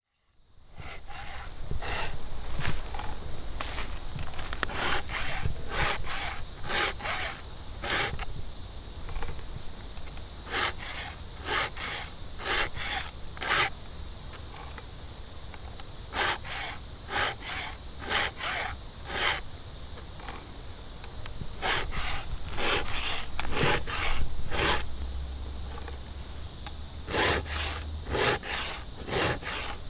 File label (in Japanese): cheensou no ha no metate; Chino Cultural Complex